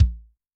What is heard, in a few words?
Basic, Yamaha DTXpress-II Kick